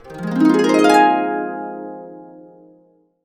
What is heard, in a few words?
Complete Harps; USB Soundscan vol.62; AKAI